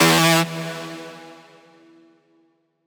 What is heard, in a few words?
synth screech oneshot E; Synth Hits; GhostHack